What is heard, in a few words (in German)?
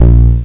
Raum bonk; amigaformatcd29; screenplay; crossfire